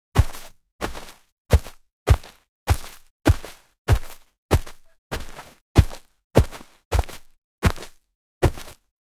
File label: single boot sprinting on